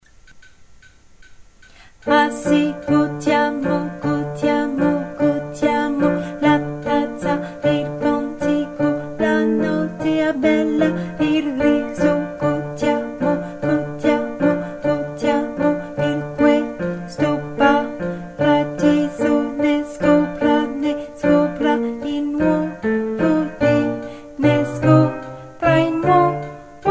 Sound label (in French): alto